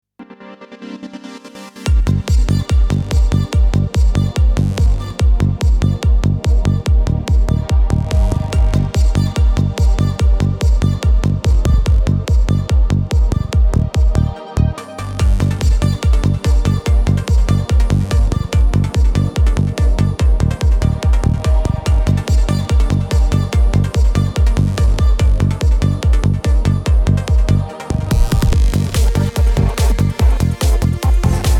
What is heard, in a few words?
Psytrance 60Hz BOOST 180Hz ATTEN 4.5 BW 6 10kHZ BOOST 7